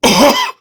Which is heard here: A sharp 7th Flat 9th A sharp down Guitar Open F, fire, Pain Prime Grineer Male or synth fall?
Pain Prime Grineer Male